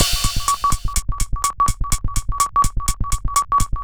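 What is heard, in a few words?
percussion, Drum Loops 125bpm, Nu Dance Electro; Zero-G; Soundclash Nu Dance Electro; Acidized Wavs; Semi-Skimmed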